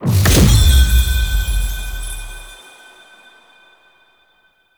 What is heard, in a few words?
Platform Activate; Warframe; Ambience; Cephalon Weave